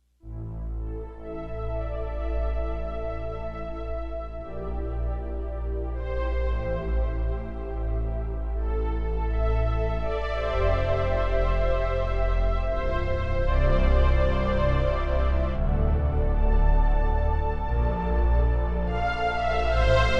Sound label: emulator2 marcata strings; dss1 demos